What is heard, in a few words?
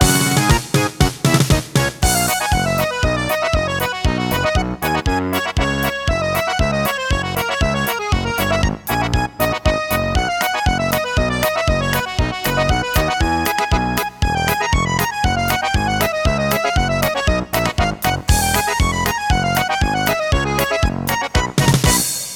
Roland SC-55 version; Rise of the Triad: Dark War; Game Rip